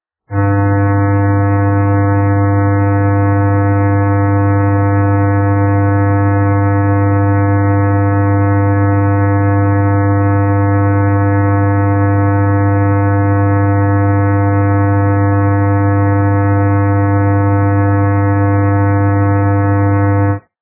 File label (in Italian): basso, beeps